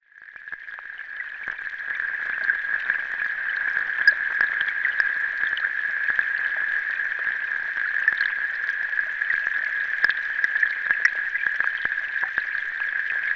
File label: duckweed pond heavy rain